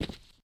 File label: default, step